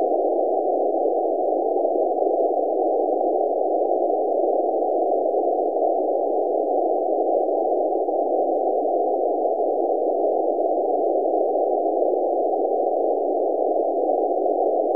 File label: K3 Noise 500Hz BW